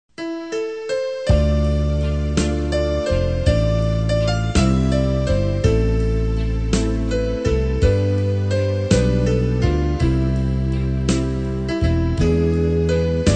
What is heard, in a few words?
bass